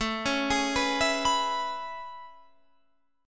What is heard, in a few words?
A Major 9th A